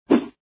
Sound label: Woosh